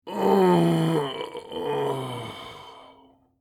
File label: Elderly man fatigue moan